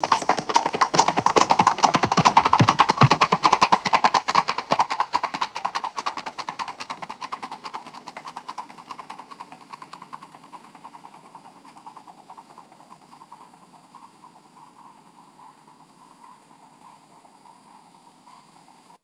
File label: horse trotting away on hard road; footsteps